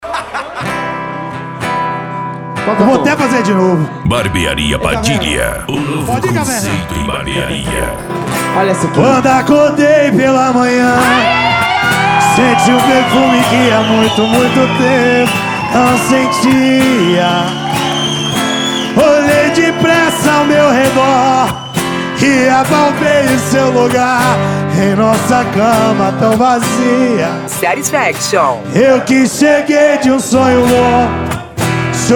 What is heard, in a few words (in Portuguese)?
sertanejo modao; barbearia padilha alto paraguai